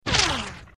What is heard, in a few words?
Left To Right Various, bullet bys